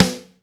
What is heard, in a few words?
Snare Drum